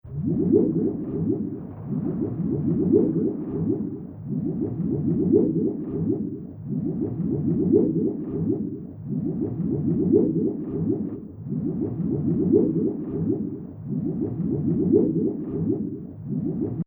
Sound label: An Underwater Background Loop